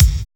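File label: pounding kick; Drums In Your FACE!; NEWJACK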